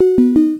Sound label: ring discord